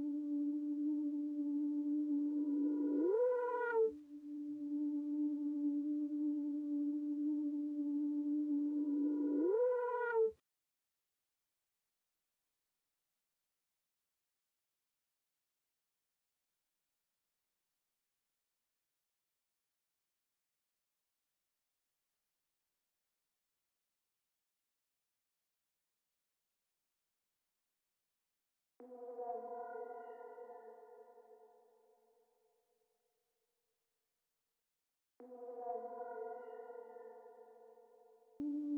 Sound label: 150 BPM G Maj Lead; Reverse; Stems; Cymatics; 2022 Melody Collection; Wishing Well